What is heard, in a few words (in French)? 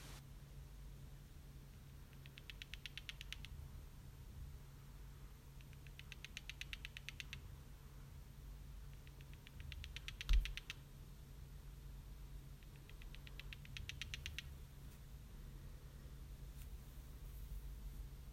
toc toc, vrillettes